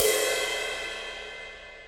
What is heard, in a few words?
ride cymbal sound clip c key